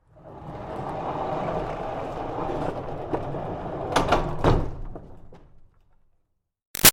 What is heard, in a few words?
Door Barn Rolling Long Roll; Doors